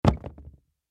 Tennis Shoe Drop; foley; Sports; Blastwave

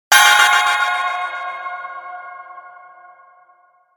Logo Shimmer Twinkle Delay; Sonic Logo; Slow Swell Ascend Production Element Imaging Element Accent Transition

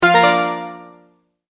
multimedia tone start; Sound Design; Multimedia Tone-Start 37; McKinney Sound

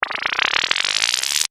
Rewind: Cartoon / Scifi Style; Miscellaneous Toy; Toys

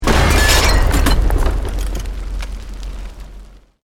Explosion Medium Brick Wood Glass; Created explosion effects, house, building or vehicle effects; Bomb; Bombs

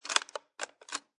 Rifle Bolt Action Chamber Opened And Closed; Gun Handling

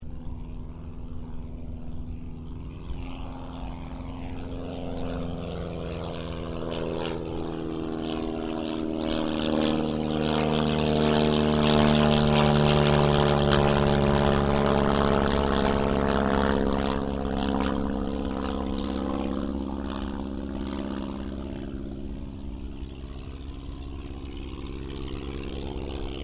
AIRCRAFT AUSTER III PASS; Airplanes; Vehicles; Airplane Sound Effects

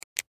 Pen Click Ink; Office; Blastwave

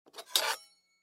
Kitchen Knife Up, Knife Pick Up; Food Prep; Household; Restaurants; Blastwave

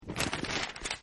Page Turn; Offices; Partners In Rhyme

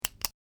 Pen Click And Release; Pen Sound Effects; office; Andrew Fleming

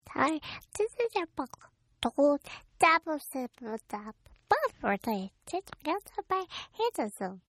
Baby Gibberish; Talking; Sound Effects:Voice; Partners In Rhyme

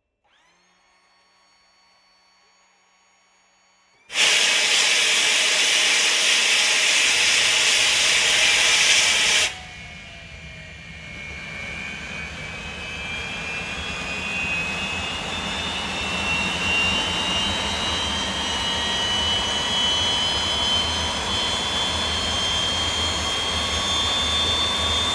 AIRCRAFT CA-27 SABRE STARTUP; CA-27/F-86 Sabre; Airplanes; Vehicles

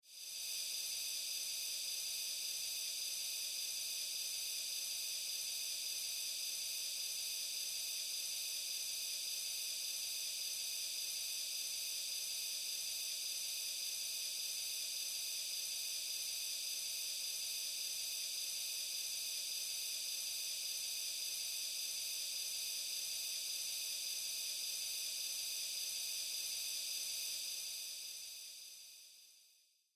Industry Gas Leak, factory; Backgrounds; Factories

